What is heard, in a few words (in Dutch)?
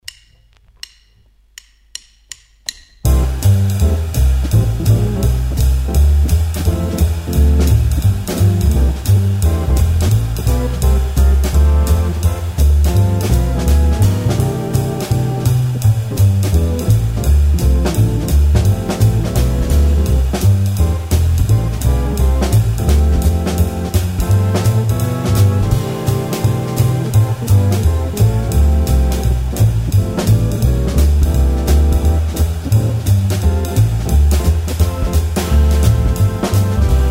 Bruut uptempo